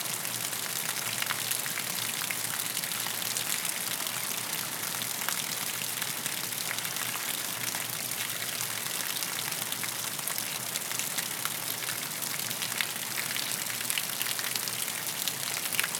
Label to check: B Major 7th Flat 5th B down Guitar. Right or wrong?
Wrong